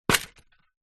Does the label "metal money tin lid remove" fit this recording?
Yes